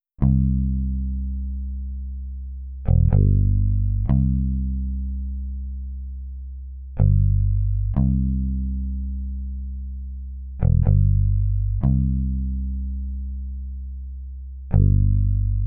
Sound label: 124 BPM C Min Bass; Cymatics; Destiny Gold Expansion; Mount Fuji